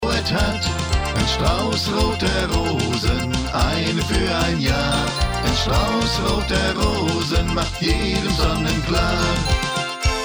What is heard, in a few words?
Polka